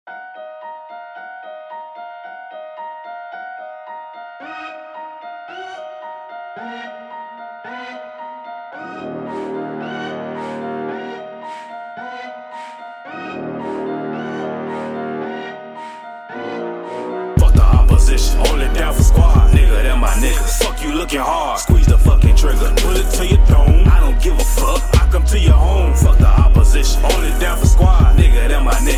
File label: Hip hop ringtone